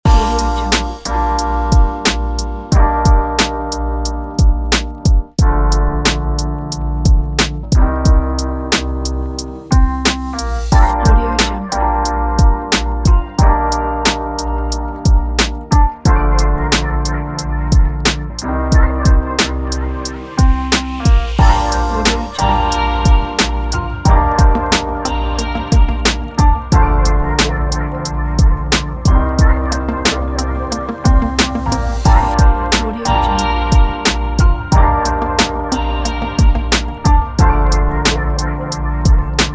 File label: lofi hip hop; beartune